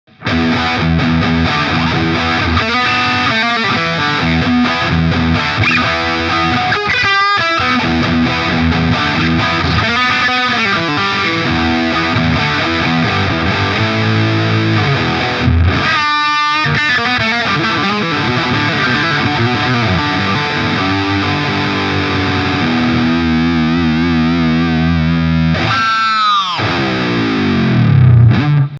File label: VH Micro Amp Head Gain max. EQ flat Deep Presence 12 h; Diezel